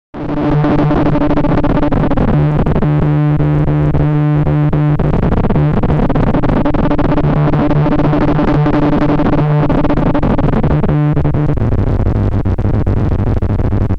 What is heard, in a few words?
MS-20 Unstable Synth FX